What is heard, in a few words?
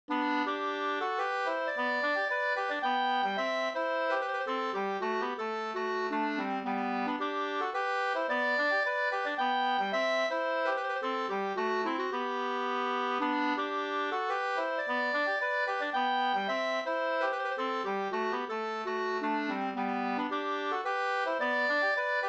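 polska, two-part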